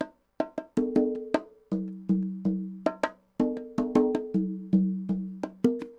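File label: congas bongos 80 bpm, latin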